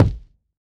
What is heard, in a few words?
Kick; Ableton Live 9